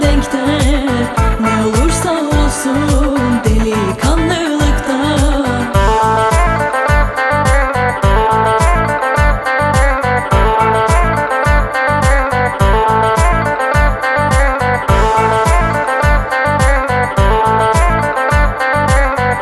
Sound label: Jazz